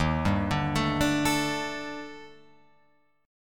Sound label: Eb Major 7th Flat 5th, Guitar Open F; arpeggiated; chord